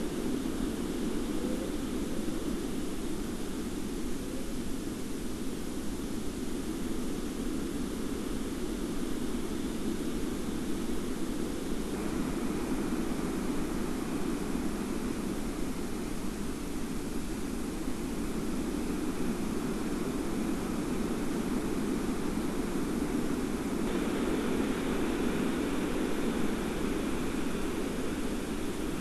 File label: WFR audio emfisis